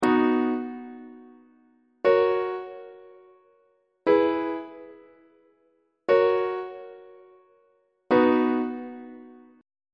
c flat major perfect authentic cadence on treble clef